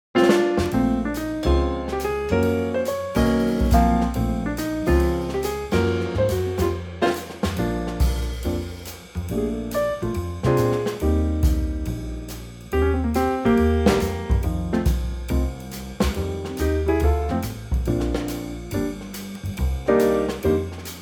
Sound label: trio Bb